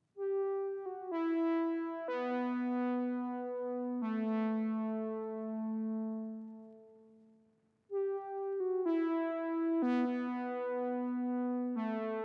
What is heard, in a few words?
124 BPM E Min Lead, Loop Stems; Cymatics; 2022 Melody USB Expansion; Heaven